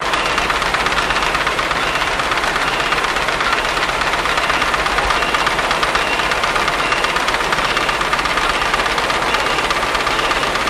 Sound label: Conveyor Belt Run Cl, factory